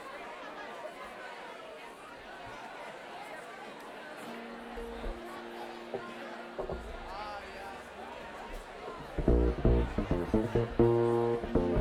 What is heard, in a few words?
Crowd Banter